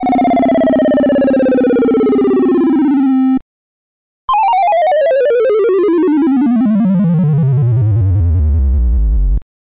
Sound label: midibox sid dropdown; cheap computer game effects; Triangle waveform on OSC1; the other two oscillators should be disabled